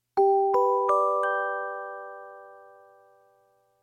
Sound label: Notification Up